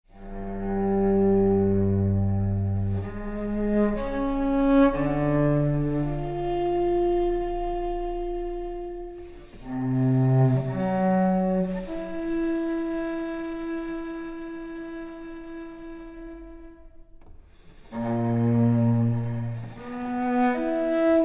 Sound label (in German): Cellothema; „Moskau – Kommen und Gehen“ nach A. Tschechows „Drei Schwestern“